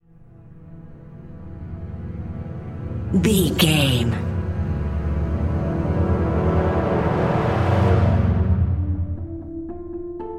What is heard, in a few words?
music cinematic reveal